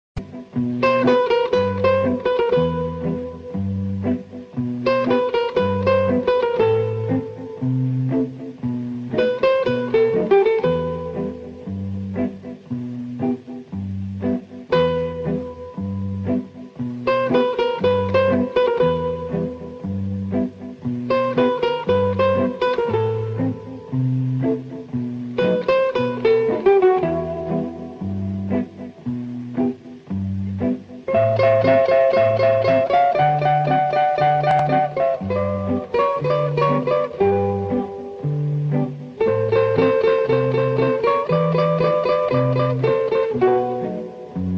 instrumental; murder by contract

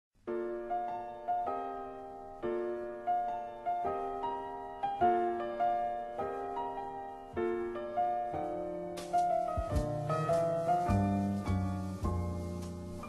piano; campbell connelly shapiro

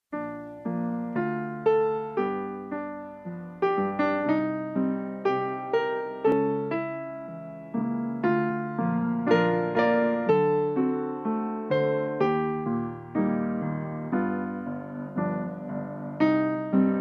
instrumental; woody allen

